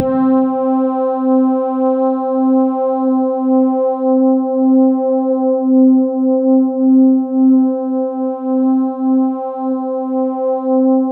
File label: C4, SynthLine